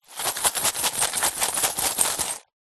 purse pouch leather containing coins and notes shake; zapsplat; foley